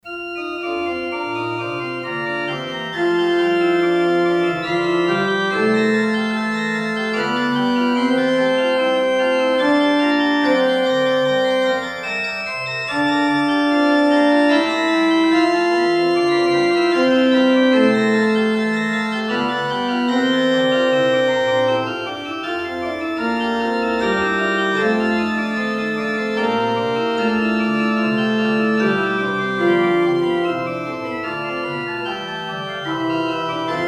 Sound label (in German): 4stimmig; BWV600